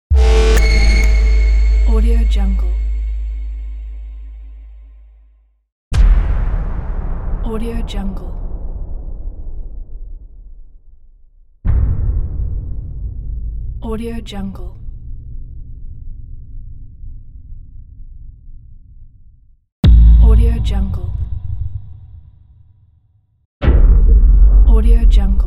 impact

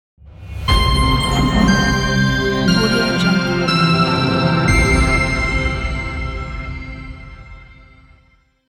scifi logo

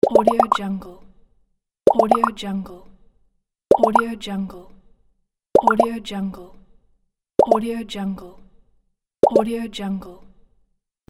bubble pops; garnavutka

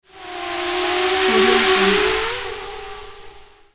little drone flyby

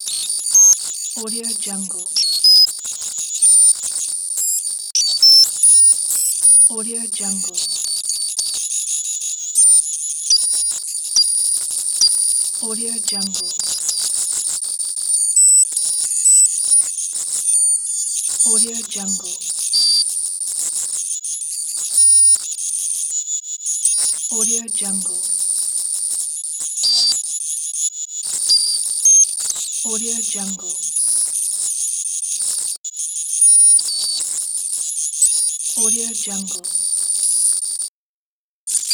glitchy hud loop; tsymbal

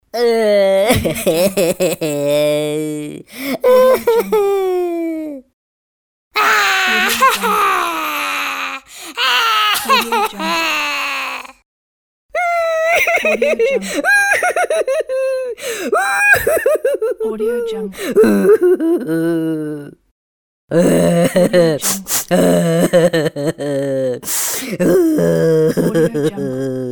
cartoon crying

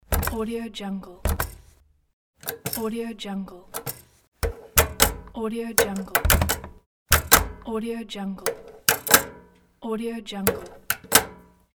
cover metal mechanism open and close

